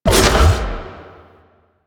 Bite Cast; Warframe; Warframes; Voruna

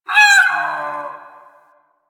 Drifter Camp Creature; Ambience; One Offs; Creatures; Warframe; Quests; The New War

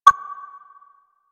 Hub Concourse Computer Beep; Warframe; Ambience; Tenno Hub Concourse Fragment

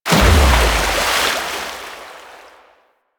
Crushing Water Positional Release; Warframe; Yareli

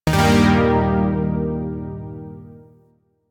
Shawzin Blues Chord; Music; Warframe; Zariman Shawzin

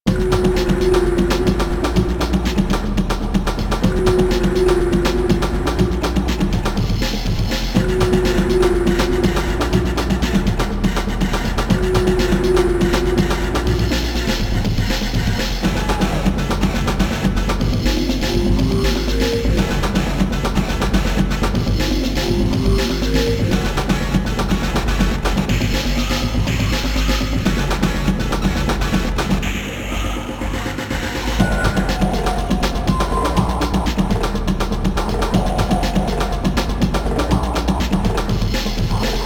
Music Level Boss; Warframe; minigames; Caliber Chicks minigame

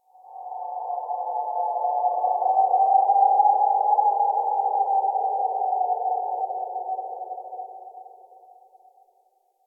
Forest Cave Howl Mid; Warframe; Grineer Forest

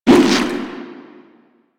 Water Balloon Fire; Warframe; Water Balloons

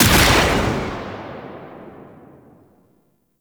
railgun charged fire, weapons; Warframe; Archwing

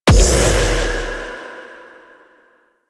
Sidearm Proj Explosion, Weapons; Warframe; Tenno; Wraith Sidearm

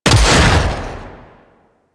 Flame Spear Fire; Grineer

